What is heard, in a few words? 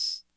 Tamborine, rhythm77